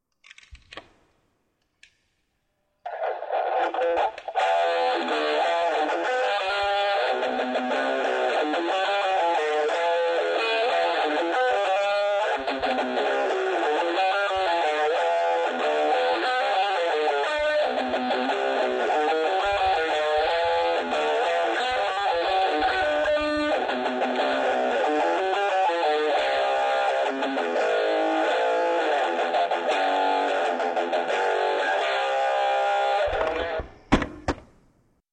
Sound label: Riff Tapes